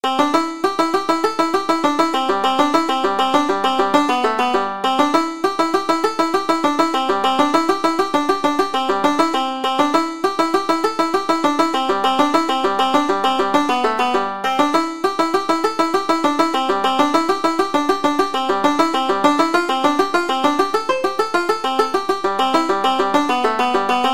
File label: banjo